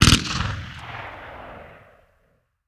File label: soundscape gunfire